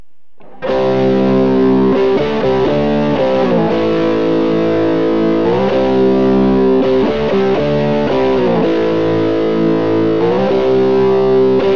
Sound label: neck crunch; Burny